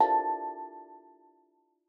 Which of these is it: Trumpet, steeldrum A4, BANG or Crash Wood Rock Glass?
steeldrum A4